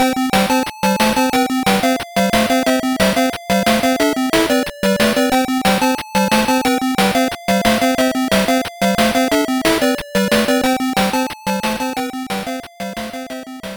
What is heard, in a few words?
Round Complete; Wonder Boy SG-1000